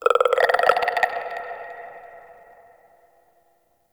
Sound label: Corpus Infested Tendon Chatter; Warframe; Ambience